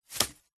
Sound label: paper thin stuffing scrunched ball throw into cardboard; foley; zapsplat